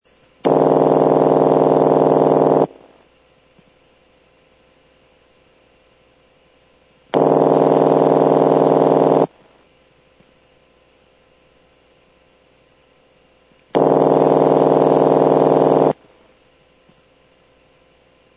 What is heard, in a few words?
Ring, North Electric CX-100 All Relay Switch; sounds from preserved step by step switches; 448 Prefix; Phil McCarter